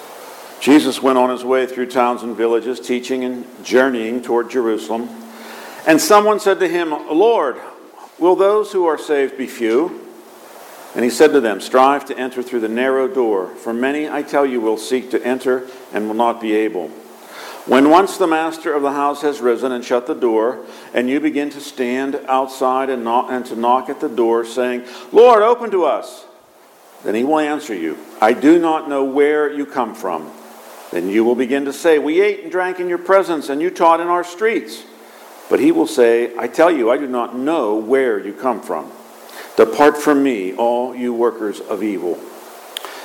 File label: Gospel